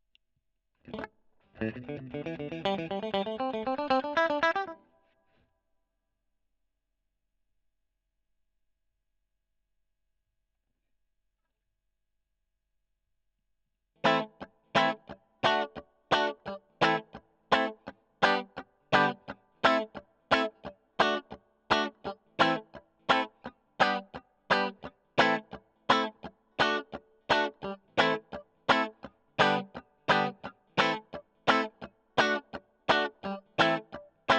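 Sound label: E.GTR, electric guitar; Gefell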